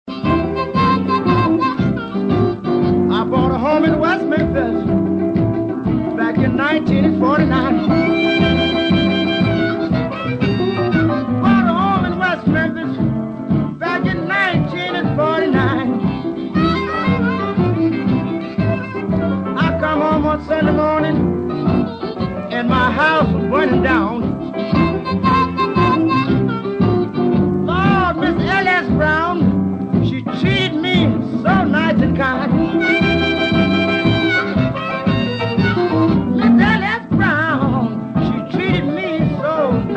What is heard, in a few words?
Blues